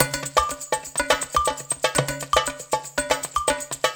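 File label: 120 bpm, Hip Hop; Spectrasonics - Supreme Beats - World Dance; Dara Hip-Hop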